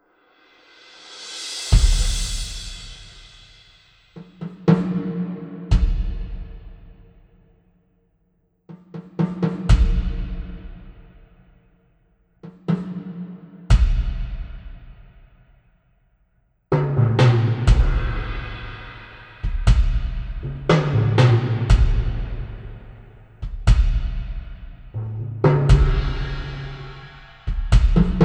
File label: aircraft hanger mallet drums only; tascam